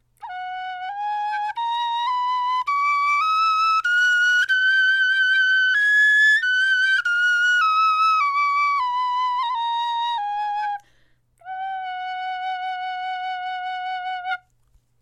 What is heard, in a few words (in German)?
tonleiter, knochenfloete